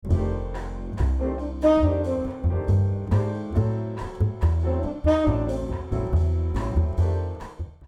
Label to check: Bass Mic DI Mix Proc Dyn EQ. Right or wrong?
Right